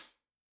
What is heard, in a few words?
Hat, Drumkits